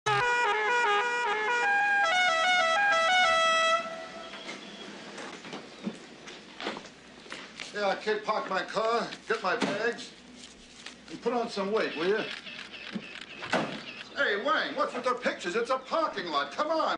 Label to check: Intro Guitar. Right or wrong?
Wrong